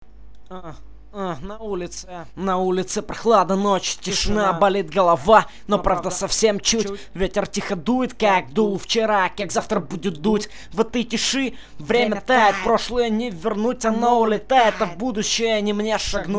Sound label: acapella